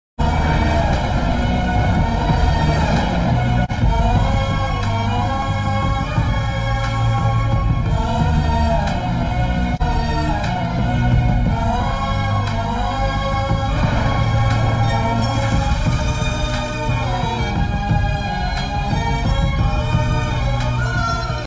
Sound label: bgm, car scene background music, love ringtone, piano flute; Manam; Samantha